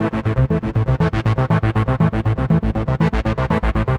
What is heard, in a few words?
Progressive Synth, Trance